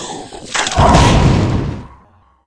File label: beetle atk, monster